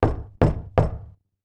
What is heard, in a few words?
Knock 3x Faster Loud